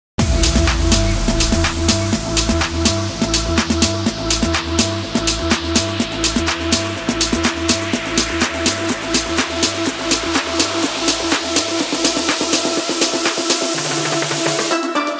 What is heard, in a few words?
percussion live